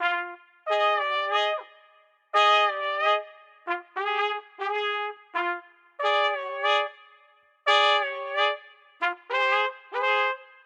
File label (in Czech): trumpeta jazz